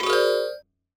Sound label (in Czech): Klavir